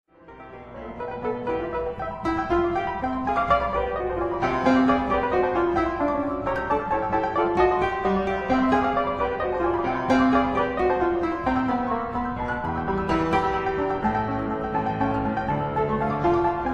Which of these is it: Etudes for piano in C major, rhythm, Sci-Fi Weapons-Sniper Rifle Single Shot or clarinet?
Etudes for piano in C major